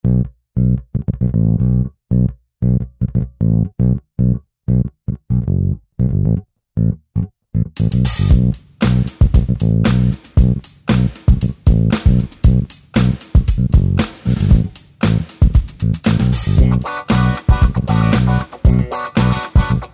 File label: real sub bass original bass only; mixing